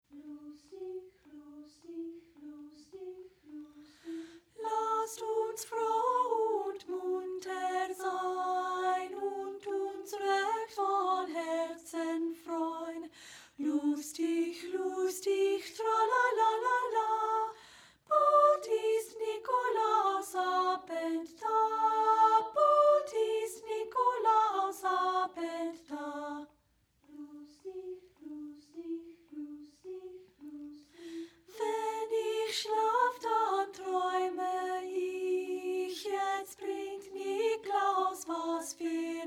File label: a cappella folk songs; SOP1; THREE A CAPPELLA FOLK SONGS; LASST UNS FROH